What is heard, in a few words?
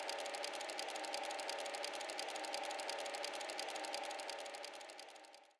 Reel Freespin; jack hammer 2